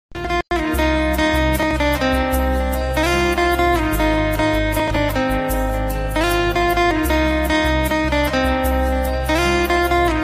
instrumental ringtone

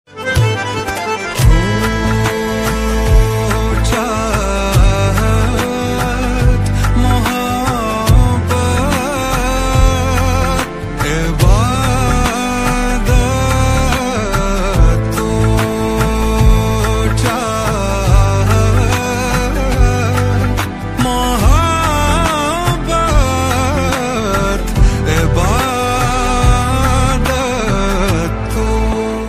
sad ringtone

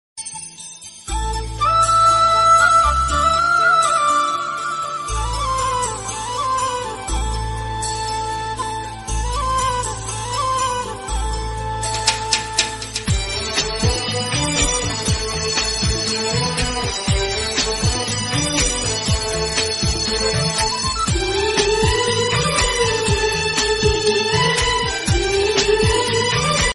ringtone bgm; chandra chakori movie; kannada

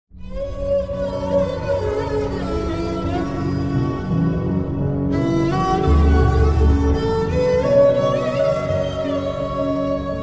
emotional bgm; hi nanna

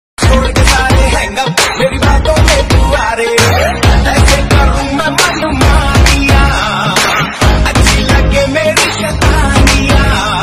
hindi song ringtone

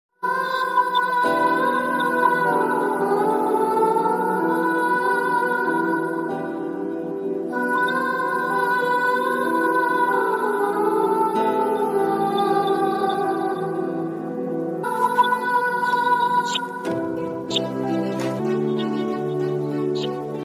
sad bgm sad love ringtone emotional ringtone; south; mission 118

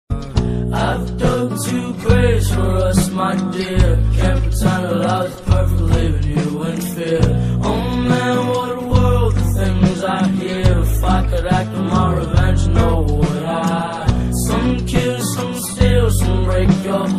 english ringtone

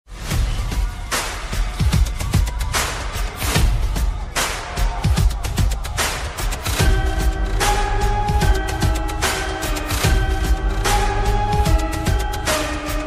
telugu bgm ringtones; v movie

